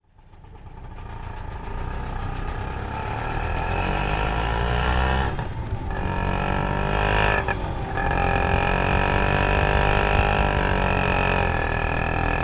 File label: auto rickshaw exhaust mumbai india